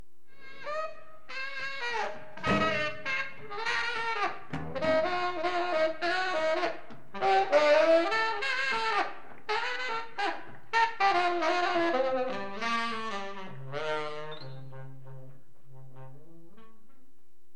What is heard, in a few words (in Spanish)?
saxo; instrumentos